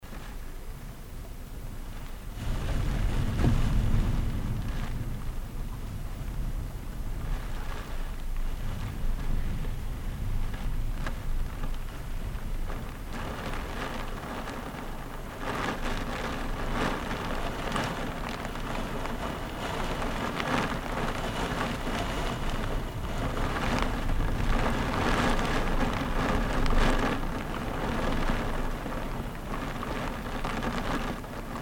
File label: july rain